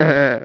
pong; breakout

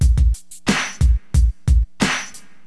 DM LOOP, HipHop, Drums; LiveAct Pool

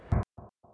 dist gun; Soldat

